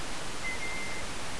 hollow whistle two, night parrot

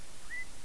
hook, night parrot

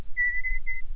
three note whistle, night parrot

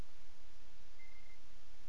one note trill

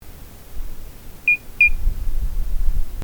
dingding

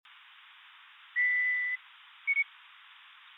antiphonal duet

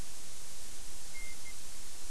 two note whistle lo

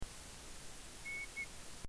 2note 4syll

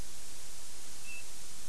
dink, night parrot

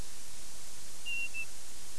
two note whistle hi, night parrot

